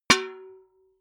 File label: metal bucket hit ring hard